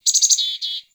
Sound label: Forest Open Glade Bird Close, Day